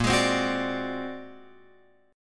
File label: A sharp Augmented 9th A sharp Guitar Open F; A#+9 strummed; JGuitar